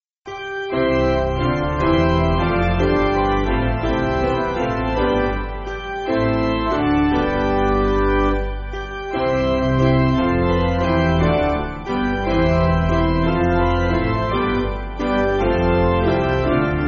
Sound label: Let All The World High Road Piano